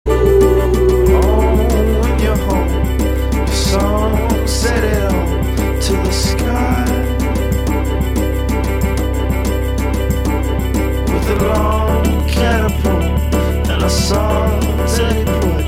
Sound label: choir